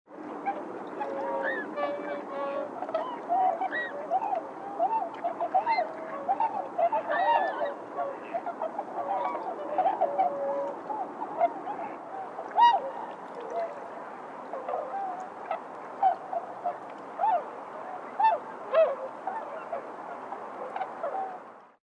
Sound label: swan, tundra